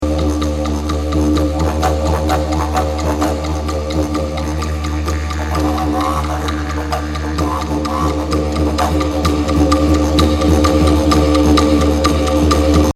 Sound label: Duo Didje Sample